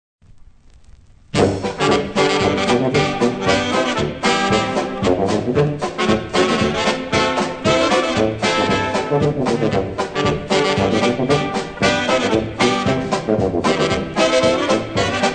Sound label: POLKA